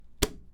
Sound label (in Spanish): Golpe madera